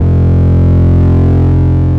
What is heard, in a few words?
drone